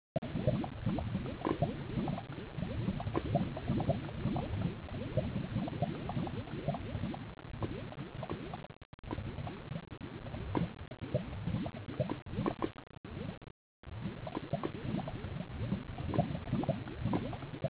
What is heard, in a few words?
lava